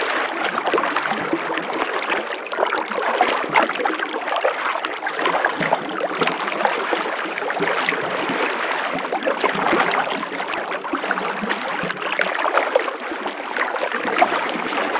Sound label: creature swim; lillypaddler